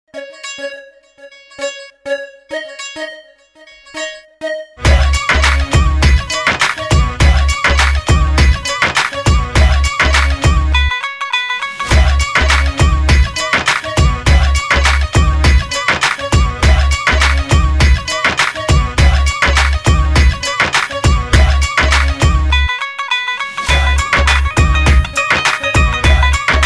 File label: Dance Floor Instrumental